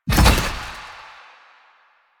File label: Collision Fast, Motorcycle; Motorcycle Ninety Nine